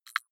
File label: click hard low, signale